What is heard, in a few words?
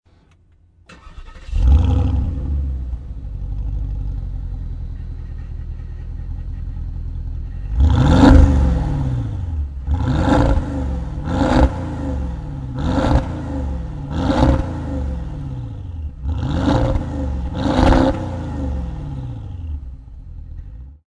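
turbo start engine gas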